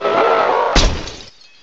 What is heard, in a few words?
CRASH